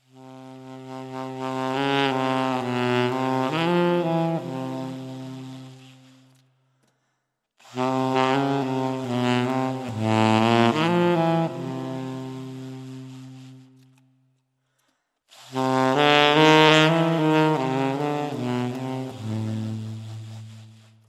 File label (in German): saxophon